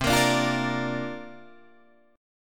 C 7th Suspended 2nd Suspended 4th C down Guitar Drop D